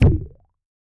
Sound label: Kicks